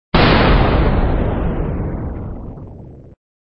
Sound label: Sound SFX, flipper